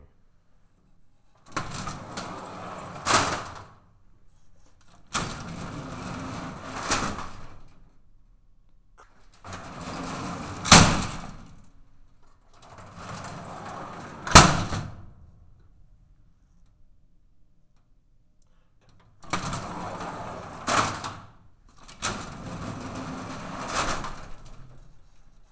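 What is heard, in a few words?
Environment interior Lift door open close out in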